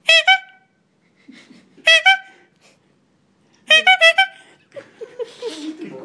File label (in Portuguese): o homem buzina; isto e um rapaz